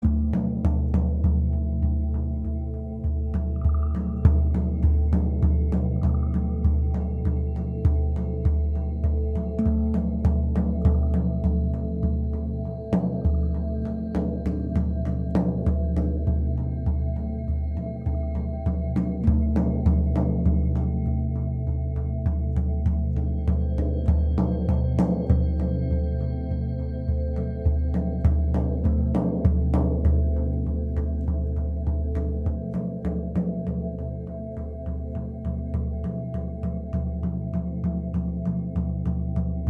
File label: Shaman Drum Sample